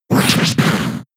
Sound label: Scratch; Pokémon SFX Gen 6 Attack Moves; XY ORAS